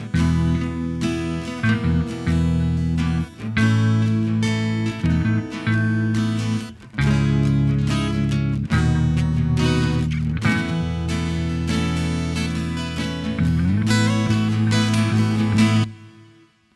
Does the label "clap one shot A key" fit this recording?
No